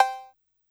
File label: G#3 Analog Cowbell, Yamaha PSR-E323